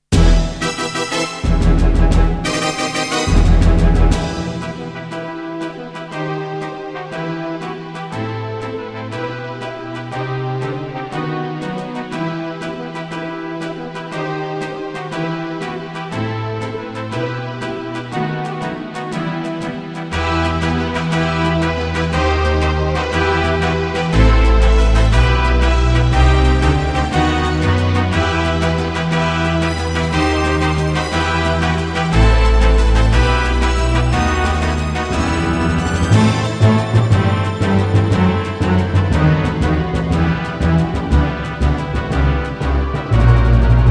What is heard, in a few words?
Midiplus MiniEngine USB; Warcraft II - Human2